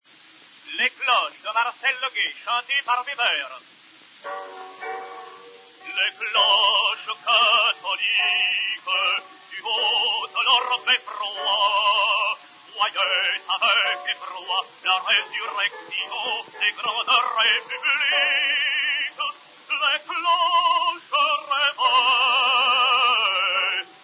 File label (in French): les cloches piano; Weber